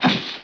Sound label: POW; The Interactive Alphabet